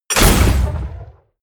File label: sci-fi plasma cannon fire